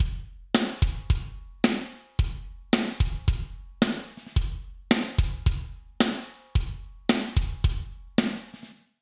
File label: kick snare mix